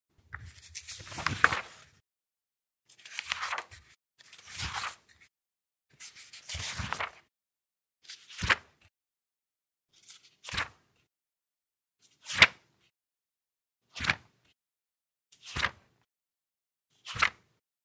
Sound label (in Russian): bystroe perevorachivanie stranic alboma